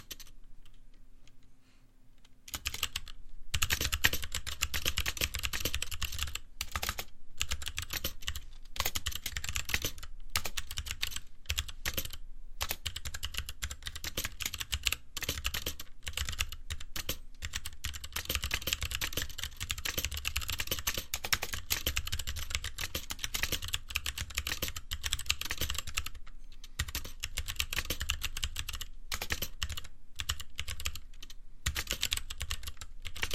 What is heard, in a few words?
keyboard; yogakeyboard